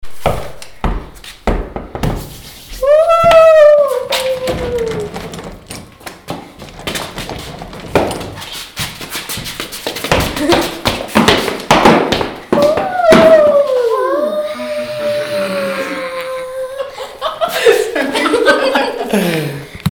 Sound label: Scary Noises; scary stories 2019